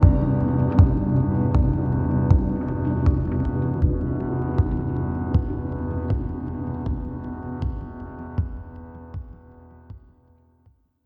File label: Battle Fail; The Dawn First War VR; VR, Windows